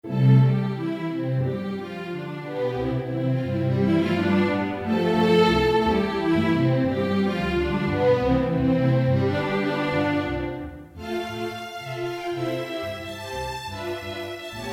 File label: string orchester